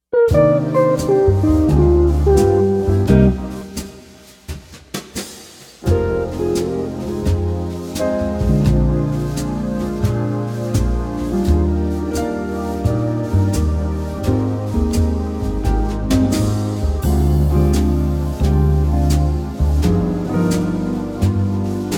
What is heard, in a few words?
ballad